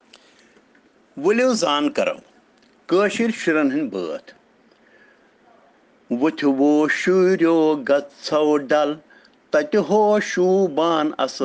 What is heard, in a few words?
Children Rhyms in Kashmiri